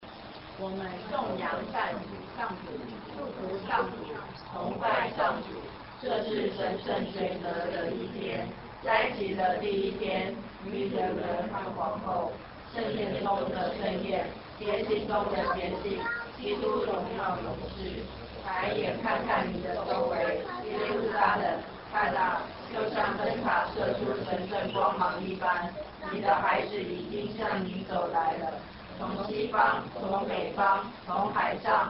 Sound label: Canon Eighth Ode; Pascha 2010